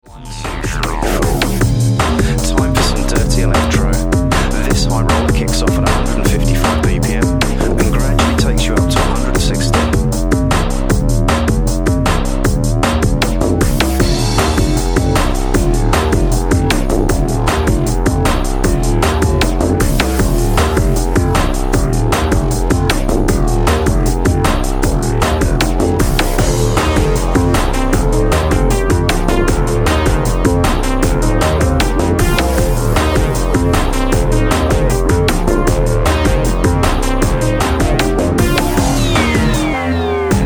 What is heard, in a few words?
155 to 160 BPM